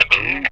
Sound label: GTR FX; E-Lab; X Static Goldmine 2; akai